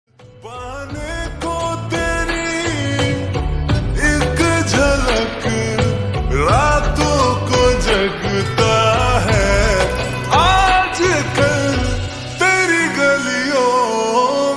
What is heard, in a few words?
Slowed Reverb Ringtone